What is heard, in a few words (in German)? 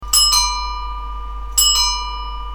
Fahrradklingel Ding Dong; Koeln